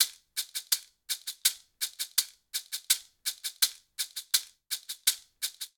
Rhumba Funk B Maracas 166bpm; Latin Percussion; Ableton; Factory Packs

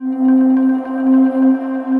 Klimper Whistle Pad, synth pad; Ableton; Glitch and Wash

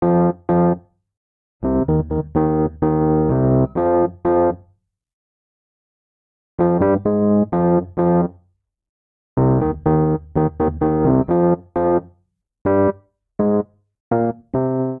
Vamp Dub F Minor 64 bpm, Electric Keyboards; Ableton; Factory Packs

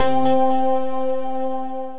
pluck, placid plucks; Multipluck; Ableton; Mood Reel

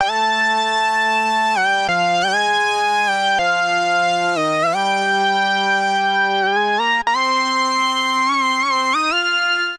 Lead Melody F Major 98 bpm; MIDI Clips; Melodic; Alpha; Ableton; Factory Packs; Chop and Swing

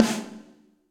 Snare Hit & Rim Low Loud Squashed; Drum Hits; Ableton; Factory Packs; Session Drums Club; MPE Hits

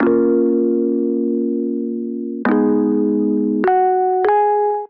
Little Amp Electric Piano Chords Bb minor 77 bpm; MIDI Clips; Accompaniment; Ableton; Chop and Swing

